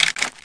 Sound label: 357 draw; weapons; svencoop